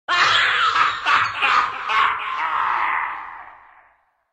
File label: laugh; pizzafaces